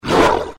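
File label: damage, sheep boss; 12zi sheep boss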